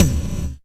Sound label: percussion, Dance Synths; Mad Kit